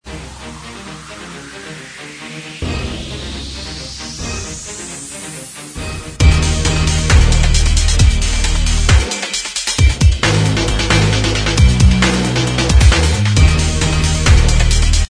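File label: instrumental, track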